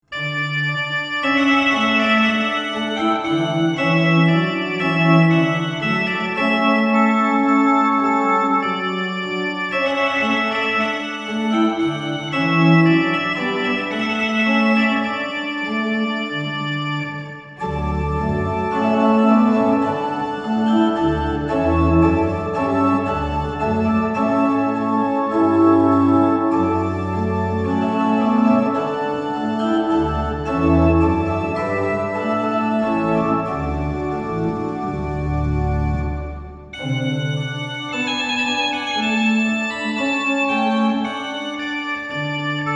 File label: Trumpet; EVOC